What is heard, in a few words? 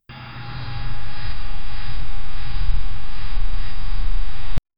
the sound of crisp clean; questioning